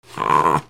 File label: DOGS